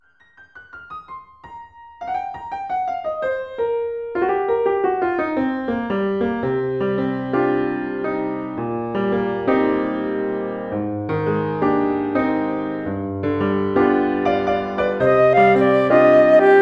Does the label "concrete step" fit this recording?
No